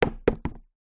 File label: vehicle trailer wheel with tyre drop wood bounce